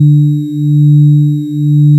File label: additive cello single; cosine; FFTimbre